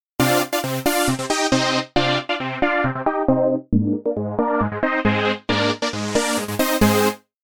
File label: trancesaw dry